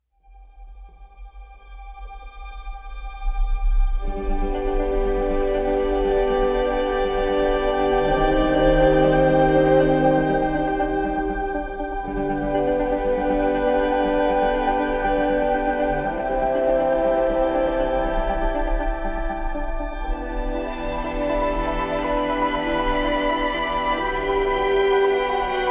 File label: Anticipation Ambient